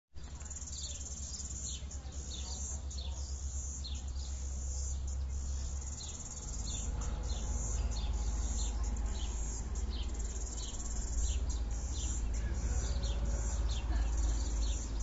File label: winery garden; palliser estate